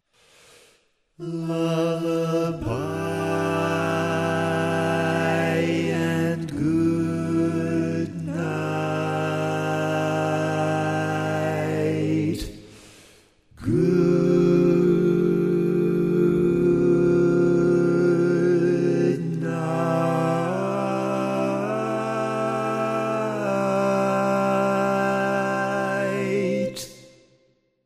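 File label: Baritone, barbershop tags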